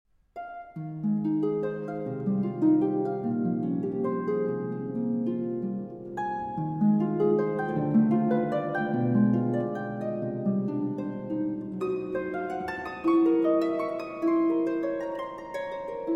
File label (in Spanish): Impromptu para Arpa